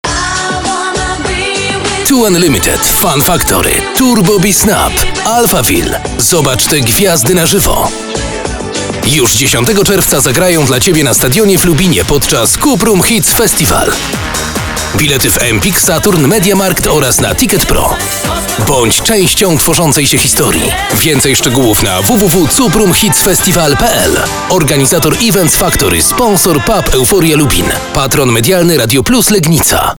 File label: festival pop